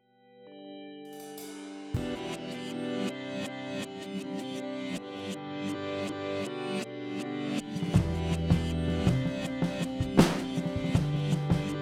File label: altpop mix